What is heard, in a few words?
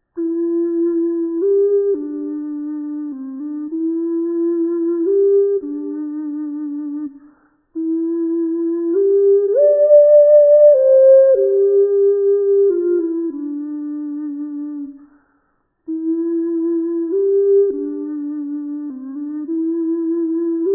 lullaby duet; zelda